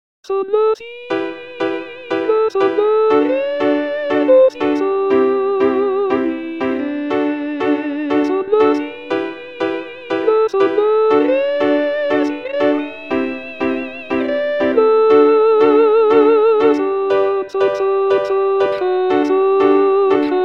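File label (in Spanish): entonación acompañamiento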